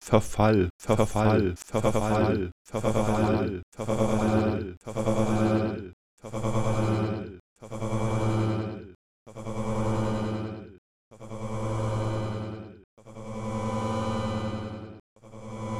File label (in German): verfall iterat vor